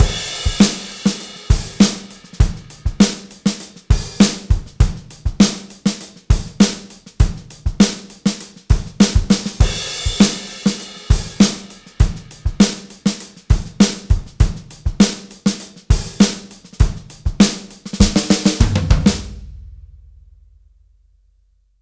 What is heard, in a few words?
drums Helios crunch